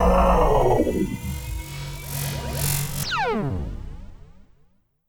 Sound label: Fence Shut Down